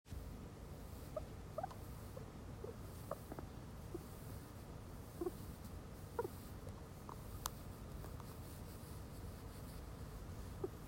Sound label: purring, guinea pig; 0歳10ヶ月12日